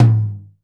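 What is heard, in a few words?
tom, acoustic set; drum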